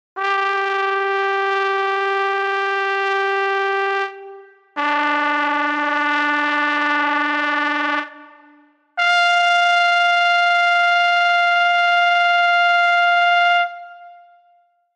TROMBA frulato

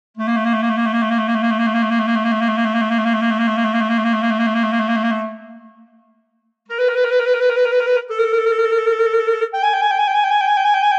CLARINETTO trylky

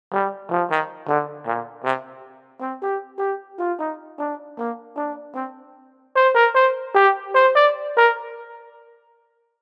TROMBONE staccato

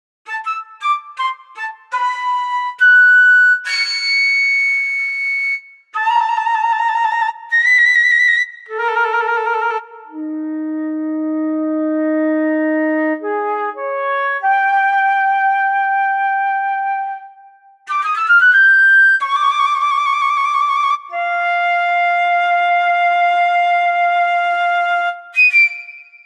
FLAUTO celek

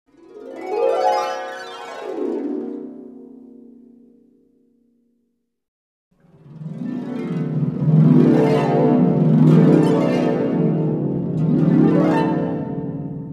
ARPA arpegia

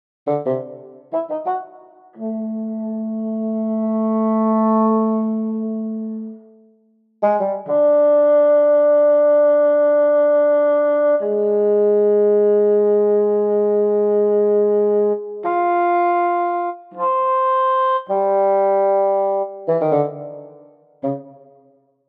FAGOTTO

